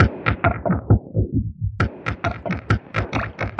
133 descending clicky filters, drum loops and breaks